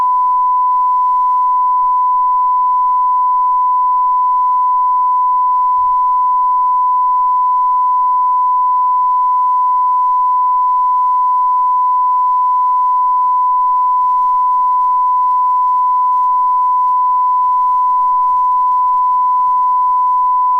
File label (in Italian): Calibrazione 94dB